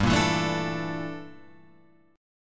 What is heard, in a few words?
G Minor 6th Add 9th, Guitar, Open F; strummed; 7,5,4,4,5,4; JGuitar